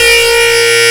Aud Edge C4, Xtreme Lead; Digital Sound Factory Xtreme Lead

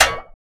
FX metal; Sound & Vision - Gigapack II

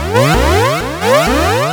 138 A#, alarm-fx, Techno; Best Service ProSamples vol.45 - Techno ID; atmo+fx-loops

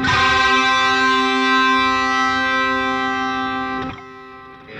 Funk Guitar; 90 s Sample CDs; Zero G

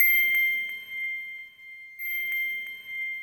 C6, Synth Line; 90 s Sample CDs; NiceBeats; SynthLine VOL-2

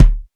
R-8 thump k

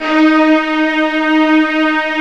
D#4 MEGA STR; ORCH.COMP

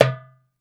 BUM, Percussion; Sampleheads; NYC Percussionworks; GJ PERC VOL2

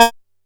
A#4, Bass; E-MU Producer Series Vol. 6 – Analogia Project; Boom da Bass

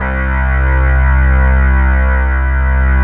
DW8 DPL C2, Korg DW8000; Back In Time Records; Korg Universe VOL-1

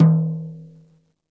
rack tom - snares off; JazzFunkKit

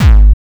hardbassdrumz, breakcore; Bazzdrumz

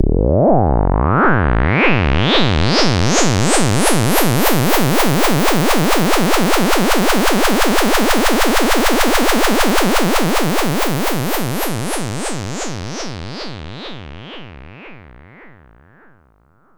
SYNTH FX

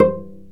STR VC PIZ, Chamber Pizz; Roland L-CD702

